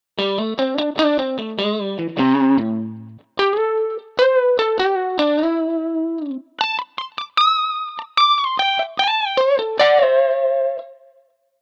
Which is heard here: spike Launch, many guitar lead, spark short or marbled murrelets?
many guitar lead